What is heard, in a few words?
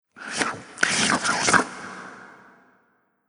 Hall Of The Hungry Vampires, monster sound effect; Andrew Fleming